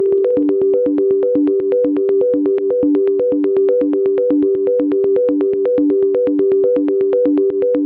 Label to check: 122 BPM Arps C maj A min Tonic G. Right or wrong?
Right